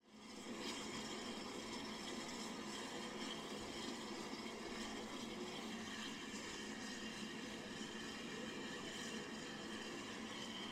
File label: transport steam train idle at station then leave